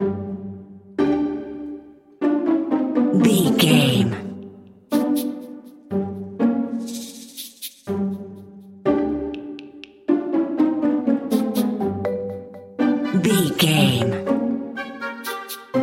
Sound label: sneaky lurking narrative